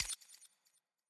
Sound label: unmute button click; premade voice